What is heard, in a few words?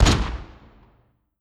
infantry fire standing